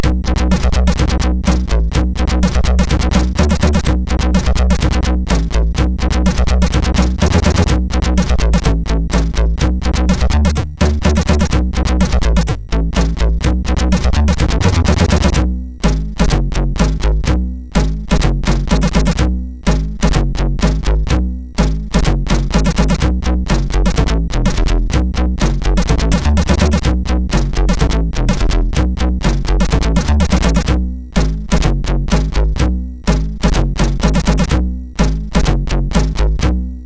Funky Bass, sid MOS6581R2

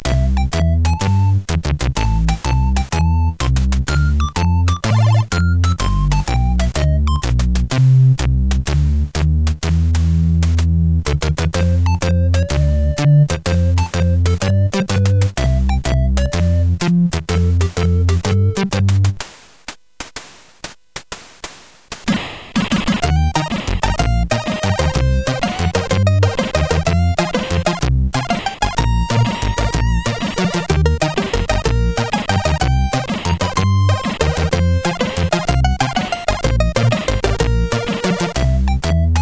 Jazz, CSG8580; CSG8580R5; sid

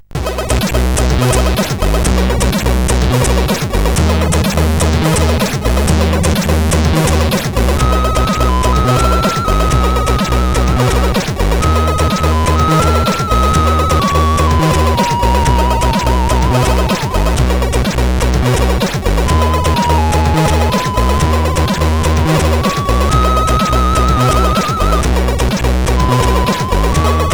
Sad Goodbye sid CSG8580R5

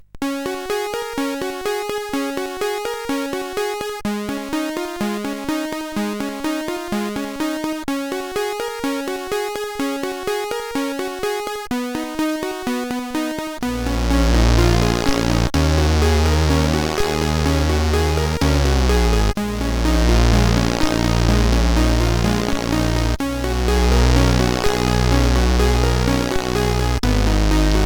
Music, sid CSG8580R5; Shit

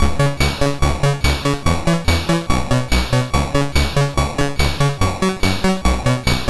Synth Sample, MOS6581R3

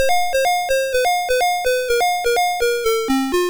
Quari, MOS6581R2

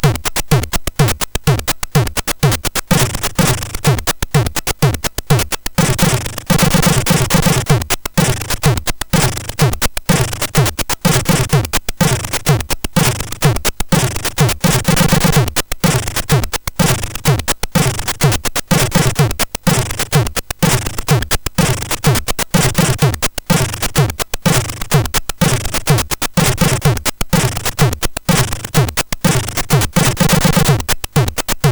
Drums, sid MOS6581R4

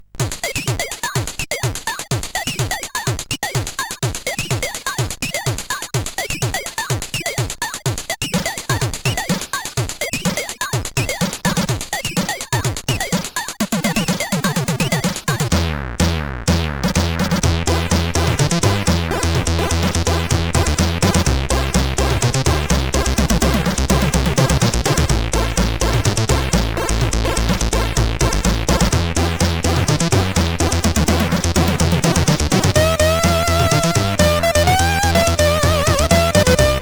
Intro, sid CSG8580R5; RWE

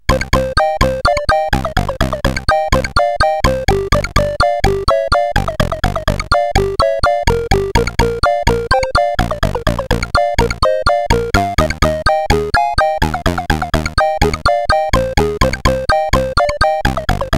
sid CSG8580R5

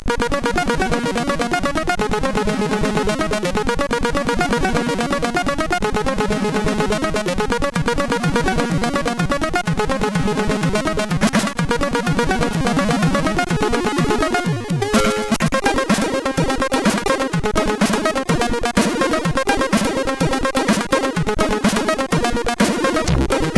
Natural Vibe, sid CSG8580R5

